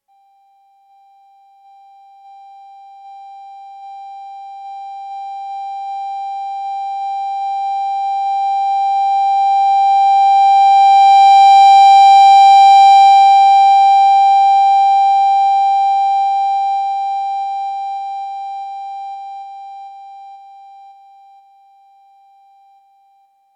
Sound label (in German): timbre dynamic sampler